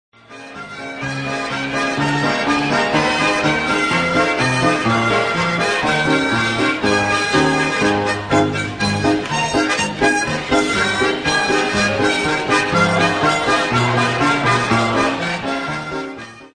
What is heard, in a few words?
harmonic